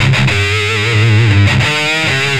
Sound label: Riff, Industrial; Nasty